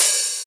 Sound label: Open HH, Cymbals